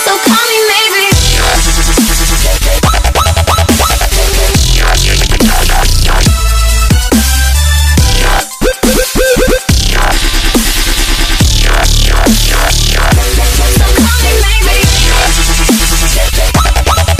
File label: Dubstep